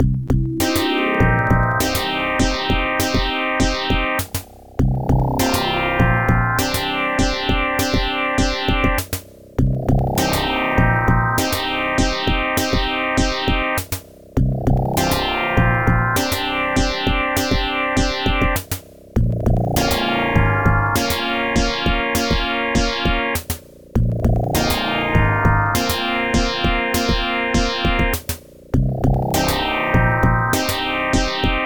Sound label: Groove; Xargon The Mystery of the Blue Builders; Epic Games